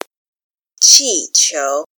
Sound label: balloon